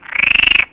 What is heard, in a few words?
saharica